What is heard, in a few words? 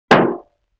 Bass Drum Rim Shot; Vintage Cartoon; Drums & Percussion